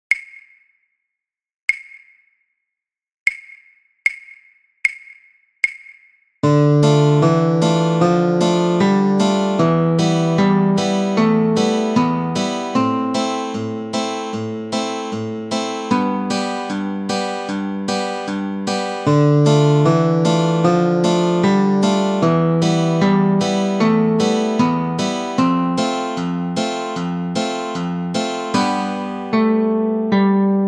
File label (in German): bridge ohne Bass